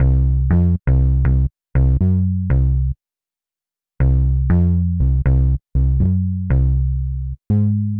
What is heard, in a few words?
Dist Bass